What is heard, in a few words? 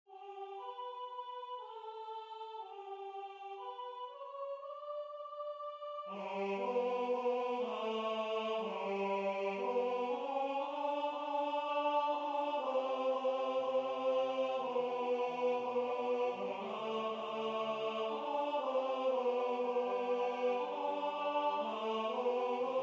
tenor